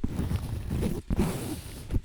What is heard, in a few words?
Course Fabric; BB Retail Therapy Sample Pack